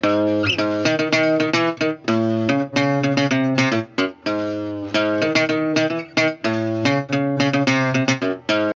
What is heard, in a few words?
guitar